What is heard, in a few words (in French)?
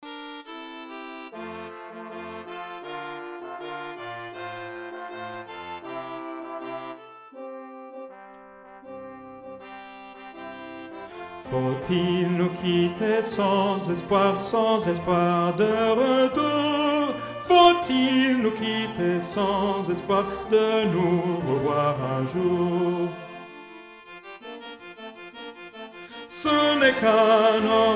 auld lang soprani alti et enfants reprise hommes